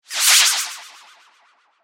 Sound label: Whoosh Cartoon, Production, Element, Whoosh, Cartoon, Warble; Pass, By